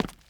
Concrete, Footsteps

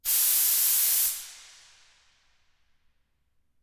pressure release; SciFi Warehouse Kit

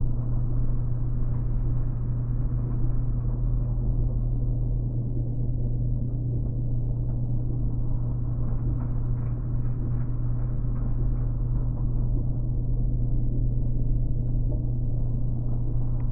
background rumble; SciFi Warehouse Kit

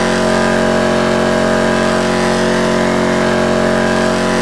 high, pickup

